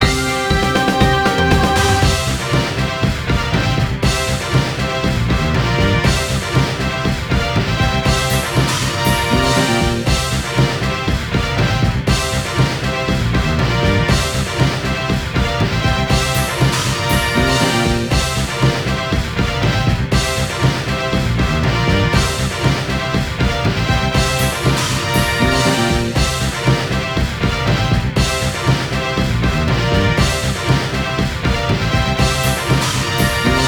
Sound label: Goal; 18 Wheeler American Pro Trucker; PS2